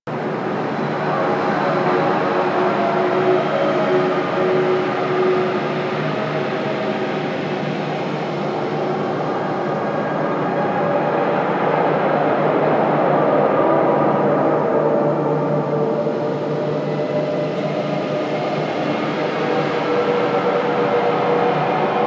Galleon Interior Amb

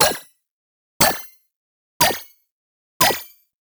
Bonus Objective Countdown; Warframe; Nokko Colony

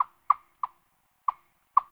Forest Open Glade Chipmunk, Day; Ambience

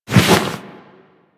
Misc Foley; Warframe; Tenno

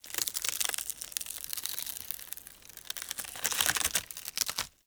Under City Tree Root Bark Crackle Slow; Ambience; Dungeons; One Offs

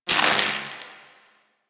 Gas Robot Repair Spark; Ambience; Props; Warframe; Corpus Gas City

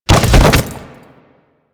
Light Fall C; Warframe; Grineer; BodyFalls

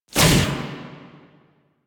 Quick Draw Shot; Warframe; The New War; Quests; Weapons; Omicrus

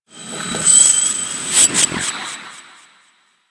Bark; Warframe; Teralyst; Vomvalyst

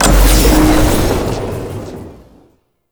Interface Click; Warframe; Tau; Somatic Bearer

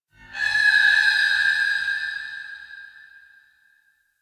Spirit Chatter, Ambience

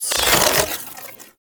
Rifle Fire Stop, weapons; Gyre

